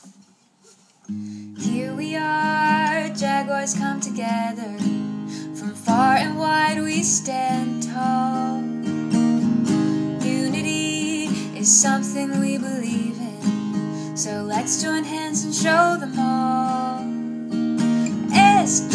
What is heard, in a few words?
School Song